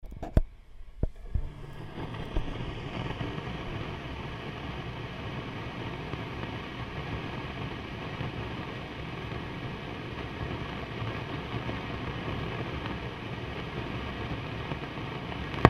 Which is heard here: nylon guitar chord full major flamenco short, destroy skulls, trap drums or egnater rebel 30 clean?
egnater rebel 30 clean